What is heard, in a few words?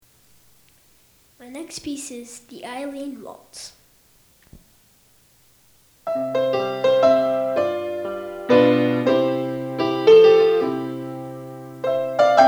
Waltz